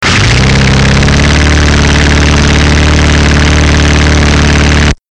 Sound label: cut grass